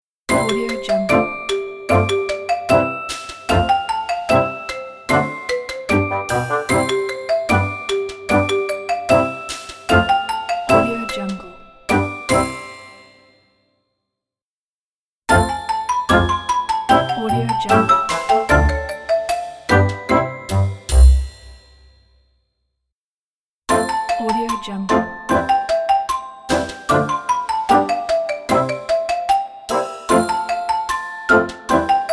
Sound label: kids celebrations ident